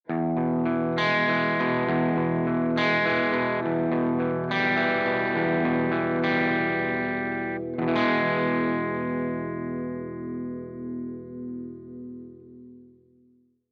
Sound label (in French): simulation baffle 4x12 marshall